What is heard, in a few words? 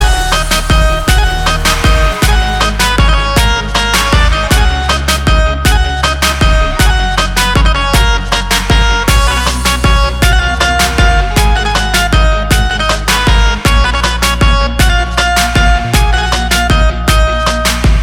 intro instrumentals